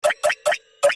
plopping dropping

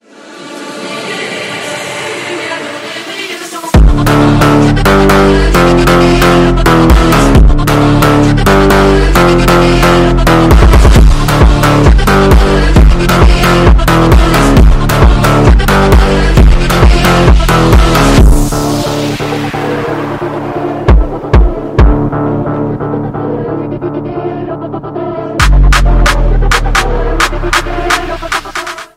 instrumental

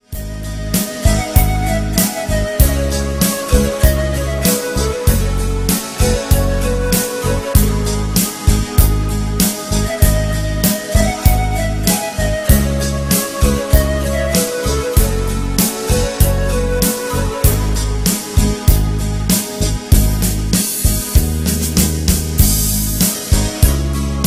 instrumental

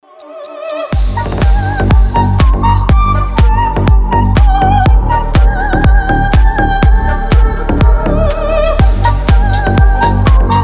ave maria organ

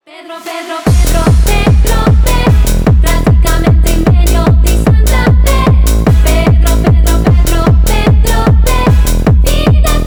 techno

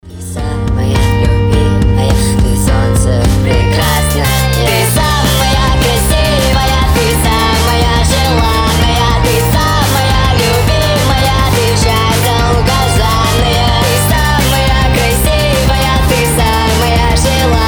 speed up

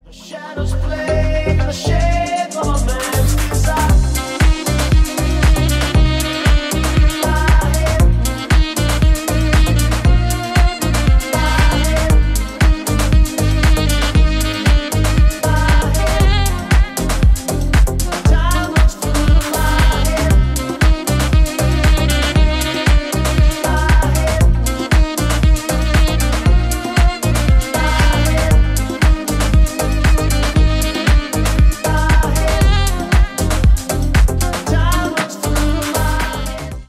deep remix sax

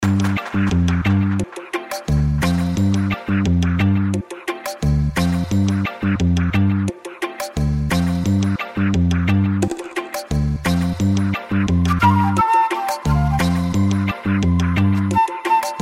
ragga aliarm

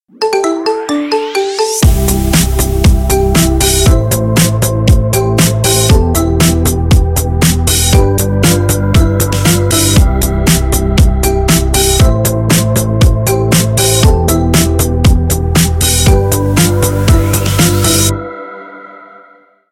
marimba remix

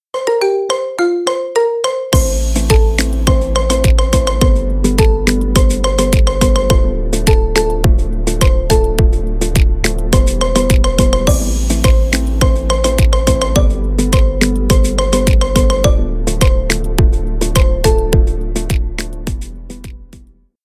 marimba remix

